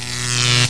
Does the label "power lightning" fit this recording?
Yes